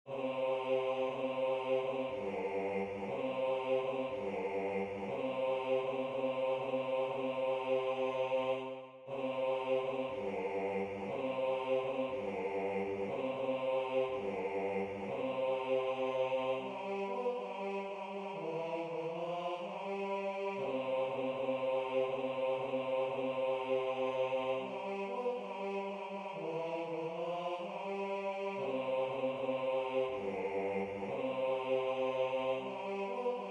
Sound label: Bass